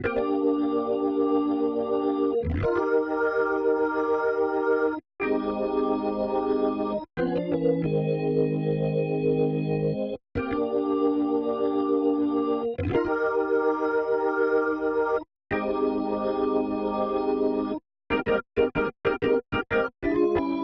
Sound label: organ A2, Smooth RnB, Ebmaj